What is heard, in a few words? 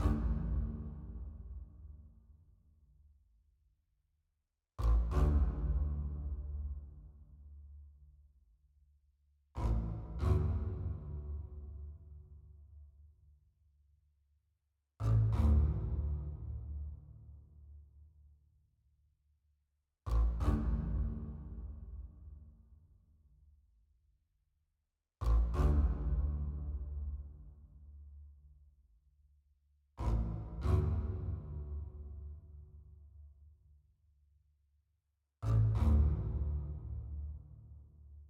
ContraBass - No Intro - 94bpm